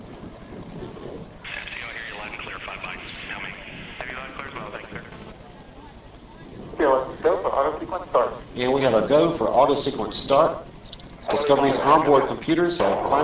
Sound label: Space Shuttle Launch Audio, no music